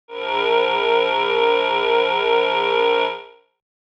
noise sound

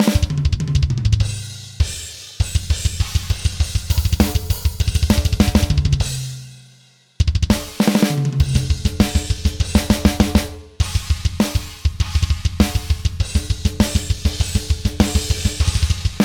drumtest, metal drums gitarre

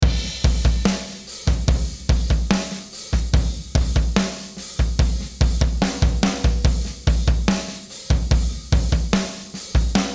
drum grey; vbc fg; Waves Abbey Road RS124

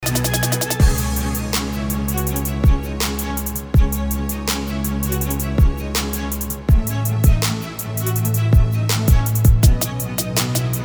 beat

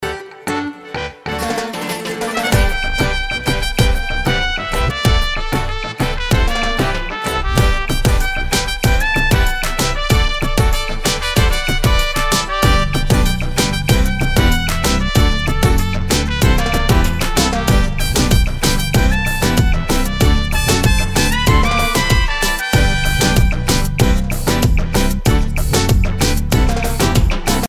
dancehall test, knackige latino trompete

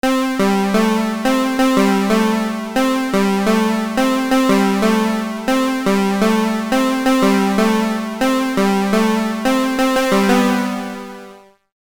SID key C4

